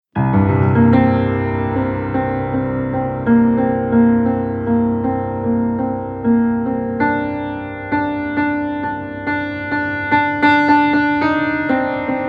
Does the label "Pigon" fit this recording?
No